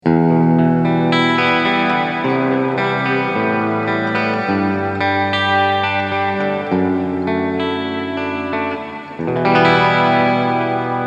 Reverb; Allpeda Devils Triad

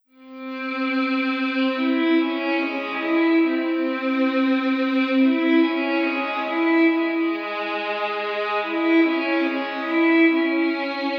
EMT Violin

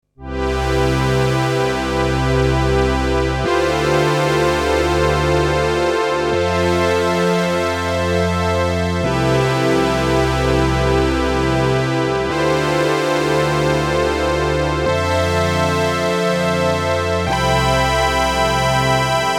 OB strings

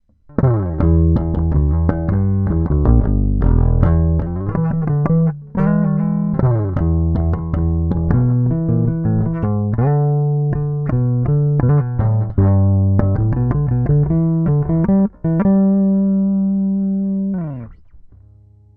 Epiphone Flying V Neck

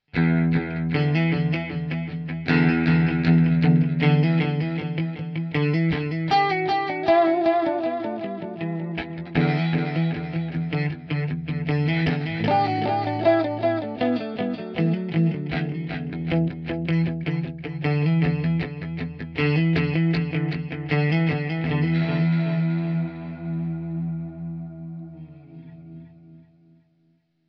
Zoom G2X FOUR Dotted Delay

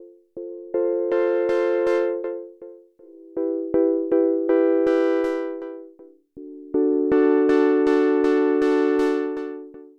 Echoed

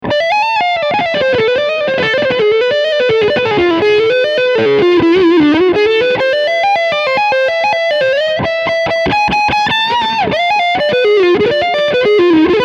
Leadsound Front PU

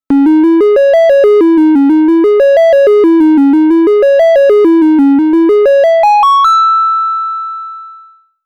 Triangle Lead

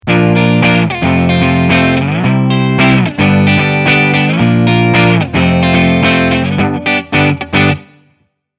Gibson Robot LP Neck CL

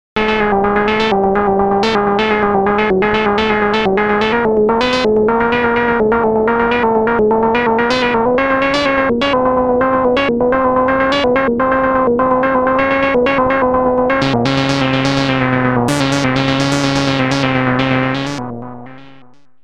Roland SH-101

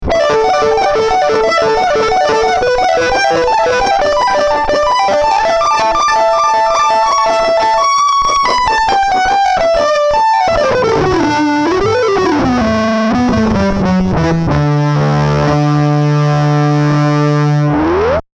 Preset Solo Synth

